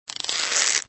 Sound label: Arrow String; Extra Lives; Android